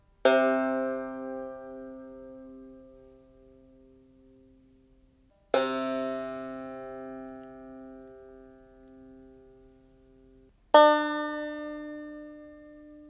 plucks

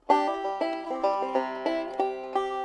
pick

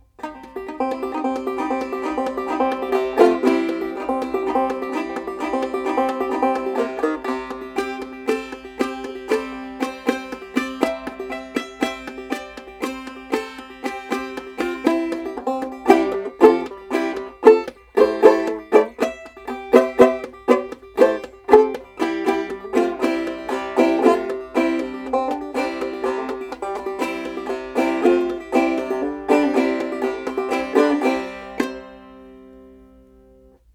steel

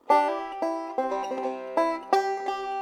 C pick, neck wood

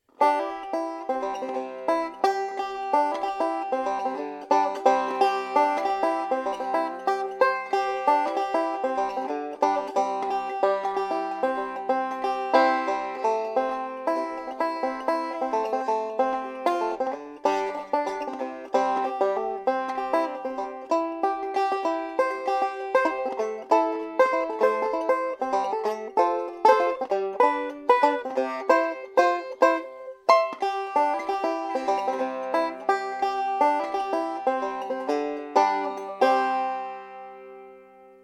C pick, neck wood

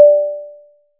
FM pluck

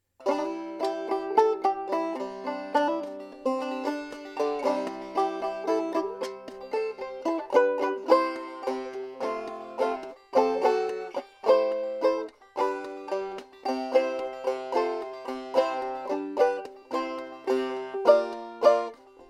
frail; neck wood

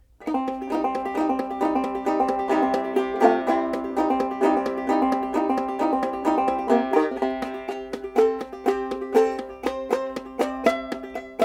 nylon

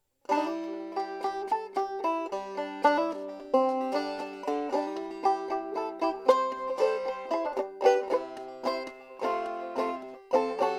frail

